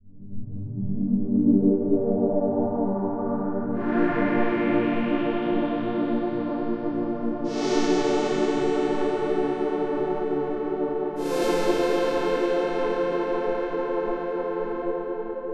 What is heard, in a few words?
pads; Pony Fan Music Remix Stems